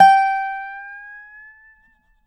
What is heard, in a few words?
DOBRO UP, A5S